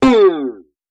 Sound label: Boing Down; boings